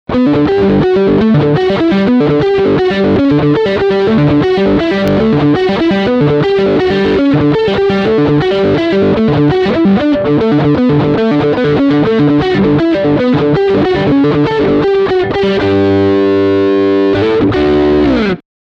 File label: Lick Middle Singlecoil